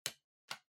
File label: PC Keyboard Far Shift